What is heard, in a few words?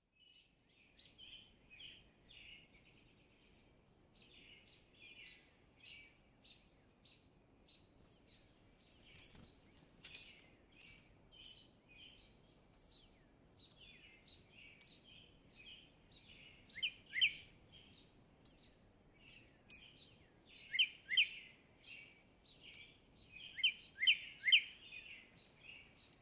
Northern Cardinal